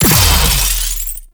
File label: Blast Charge; Warframe; Captain Vor; Abilities Redux